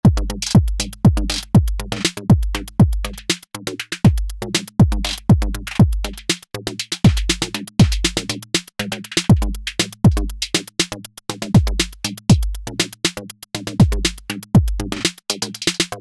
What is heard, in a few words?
drums